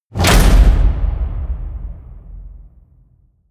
slam, tueren

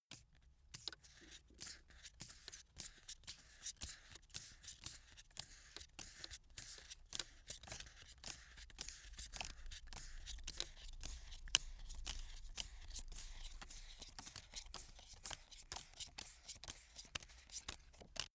playing cards deal; comedy-cartoon; spielzeug

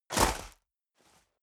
step boots gravel medium jump; schritte

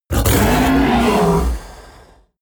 coloss roar loud deep aggressive; kreaturen

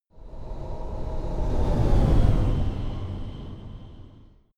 sci-fi vehicle train passing; raumschiff

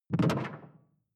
sci-fi alien bubble; swooshes-swishes

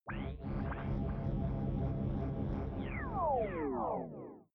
sci-fi night fall small planet, drones

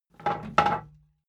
wood board handle roomy; objekte; holz

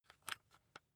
rock drill head remove regular; bohrer; soundpool

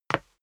step dress boots wood parquet slide short

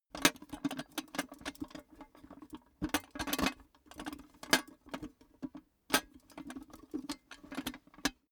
cash box metal handle; maschinen; soundpool

